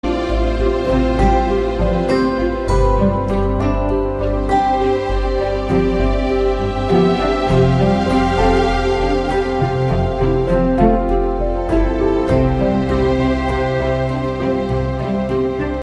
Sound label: high mountains background soft music tone